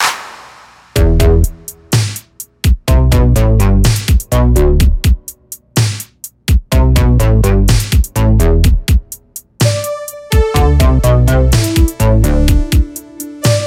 retro futuristic serum presets

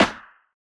snare; nova sound

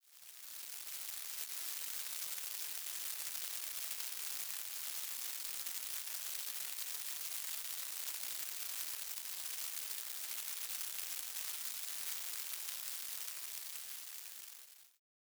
light rain

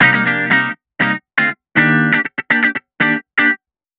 multi dry

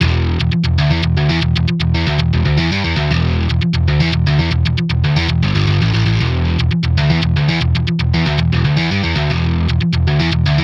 crunch

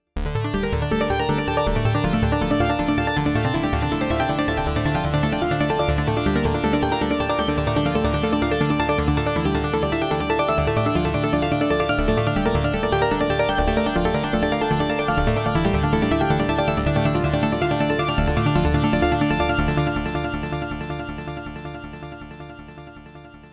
synthex speed arp; pro12

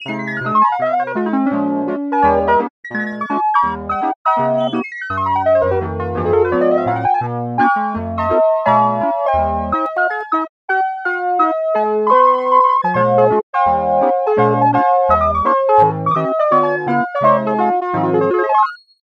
key electric piano

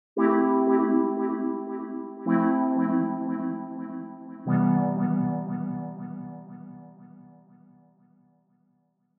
wave synth

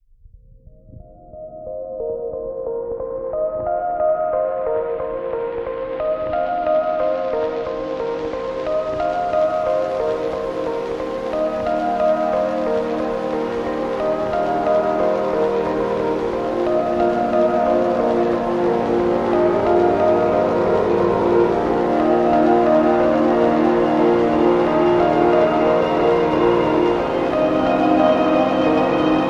mellow; samplescience nouveau

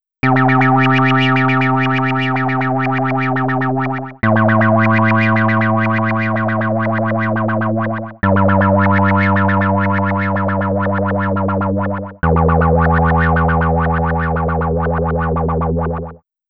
ring modulator

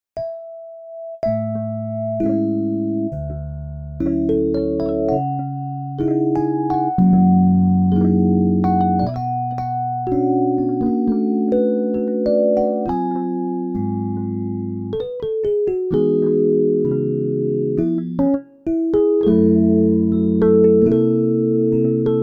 preamp reverb

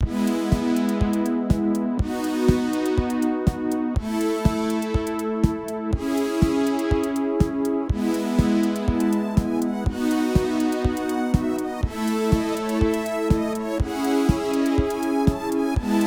soft saws

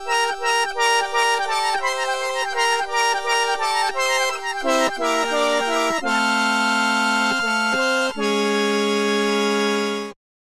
accordion synth

